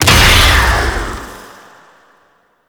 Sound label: Scarab Swarm Kavat Burst; Warframe; Mummy